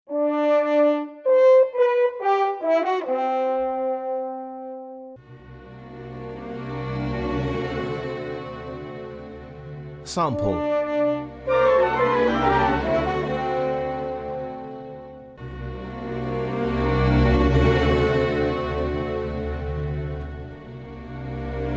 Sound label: classical